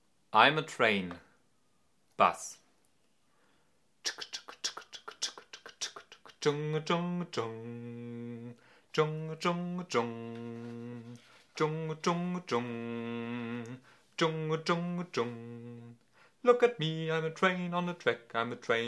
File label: Bass